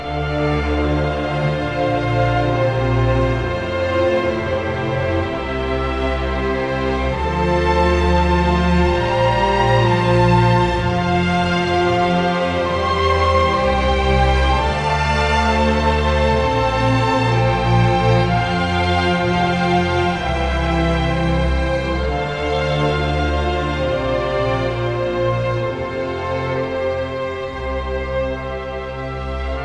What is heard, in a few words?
LOADING; brothers in arms earned in blood